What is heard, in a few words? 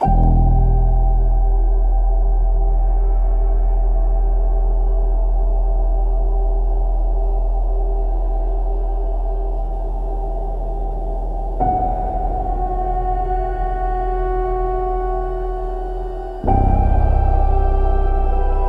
spiritual spooky soundscapes